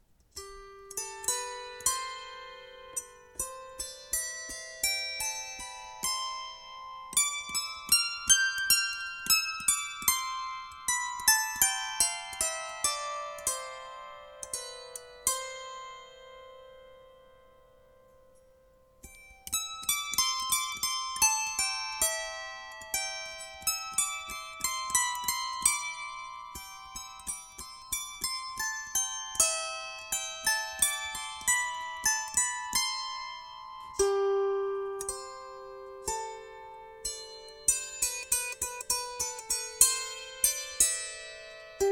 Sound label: melody harp